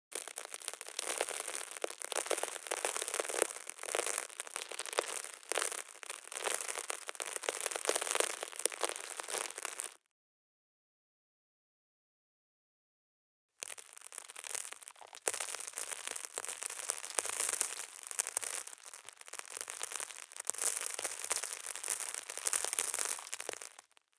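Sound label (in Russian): grain pouring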